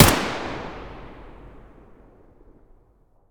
ak74u muzzle stereo; STALKER; IWP

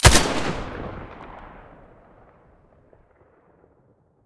scar muzzle stereo

